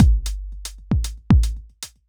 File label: 808 Kick n Hat; Sonic Foundry (Sony Creative Software); Ugly Remnants VOL-2